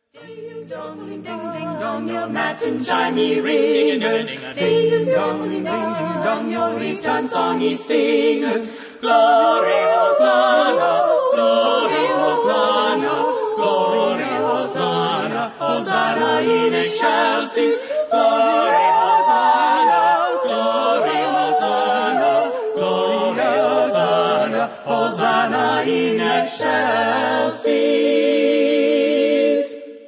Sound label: Ding Dong